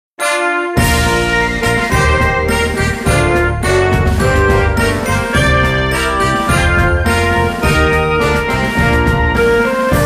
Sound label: Music Menu event